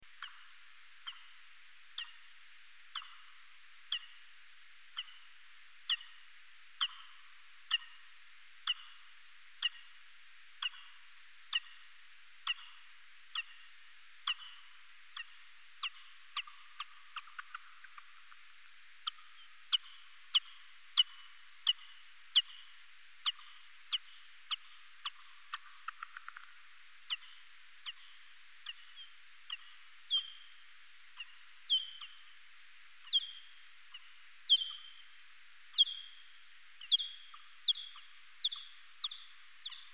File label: buzz